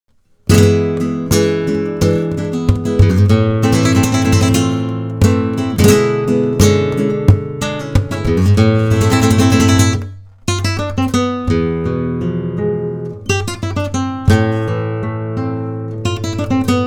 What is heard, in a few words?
farruca, flamenco